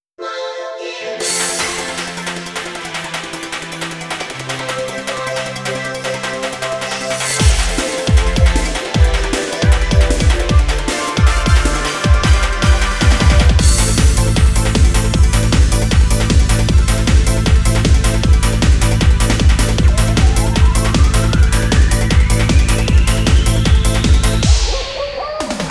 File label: Monkey Dance; beatmania IIDX 8th style